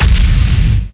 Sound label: bomb, weapon